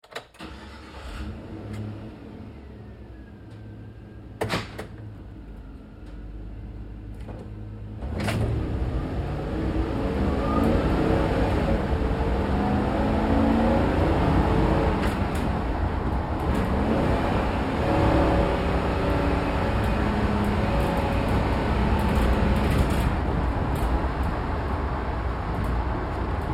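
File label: Truck GMC Savana Interior Drive Accel Decel Fast; Trucks; Big Room Sound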